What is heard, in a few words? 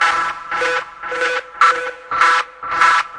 150 fx guitar; GUITAR LOOPS